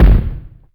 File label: reverb steel kick drum sample b key